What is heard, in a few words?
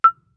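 Marimba deadstroke fortissimo E4; MIS Pitches - 2014; Percussion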